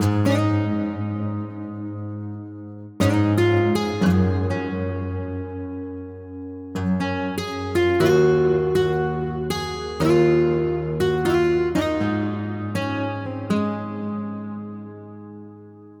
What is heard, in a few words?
guitar